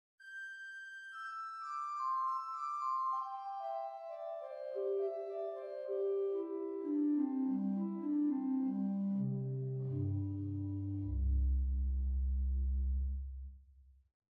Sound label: Gt Bourdon, hauptwerk